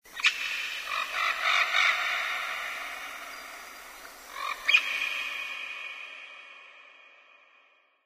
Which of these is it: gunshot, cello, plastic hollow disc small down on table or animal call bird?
animal call bird